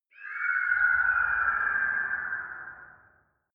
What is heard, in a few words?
ghost birds